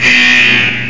red alert

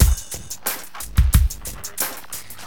DM LOOP, Hip Hop, Drums; LiveAct Pool 1

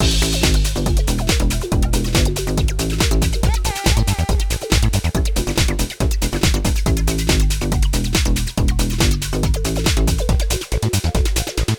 Digi Funk; balloon rain; Music

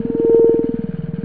probe droid loop; MOTS

